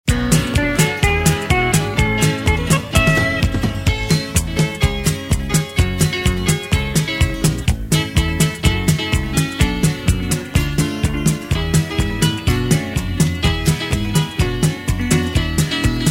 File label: music